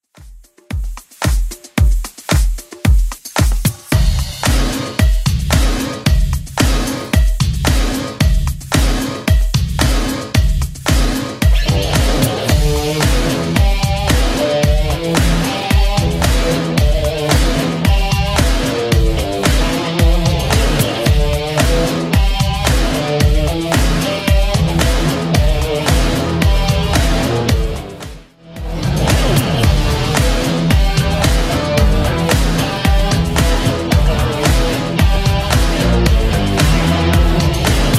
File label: 80s Club Edit 112bpm